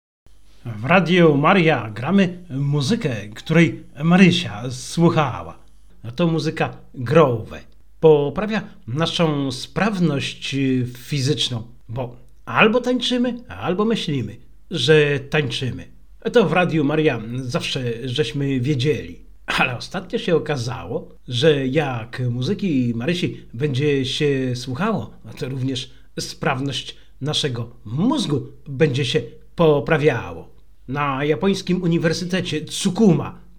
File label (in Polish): Muzyka Groove